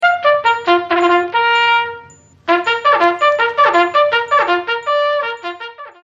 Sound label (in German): Klingelton Fanfare von Motorola